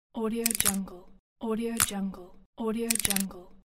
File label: bone crunch